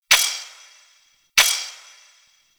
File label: Lantern Shine Ticking Loop; Warframe; Emissary Season Two